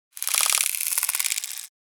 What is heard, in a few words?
Insectoid creature alien clicking